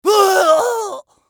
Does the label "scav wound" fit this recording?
Yes